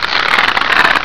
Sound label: crack